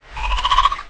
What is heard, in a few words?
Crab Idle; unsighted; enemies